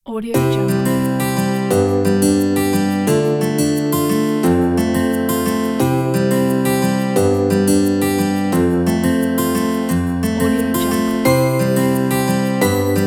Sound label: positive slideshow; olegtatarchuk